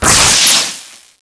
field break

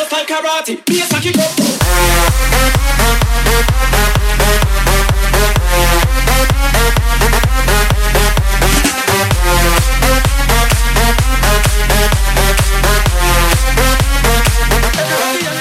electro; roundsound

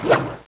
tentacles swing

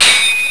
katana wall; jailbreak

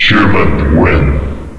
humans win; jailbreak

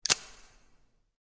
c4 spark

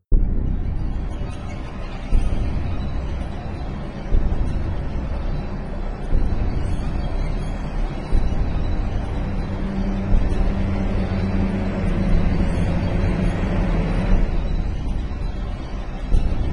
music

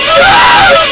scream; zombie plague

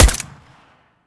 usp, firesound

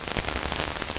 static light; cstrike; D26 slender

